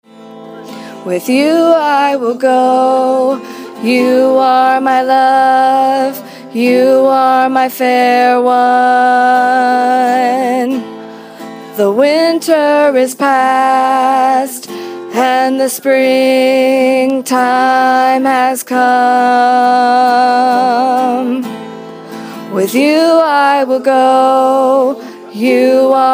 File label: 3rd above